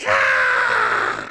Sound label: kid pain; buu